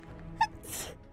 Sneeze

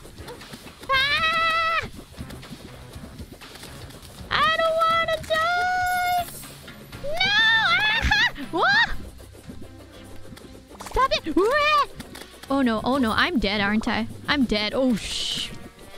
struggling; IRyScream

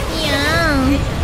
iyaaaa

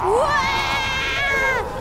waaaaah; IRyScream

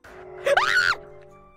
jumpscare; IRyS; MC Guardian's

